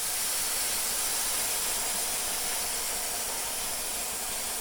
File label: steam release; gasmask